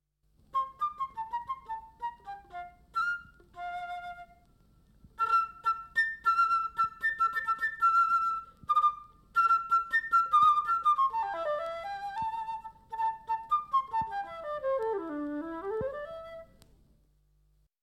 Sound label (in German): Zwitschernde Piccolofloete Solo; Konzertstimmungen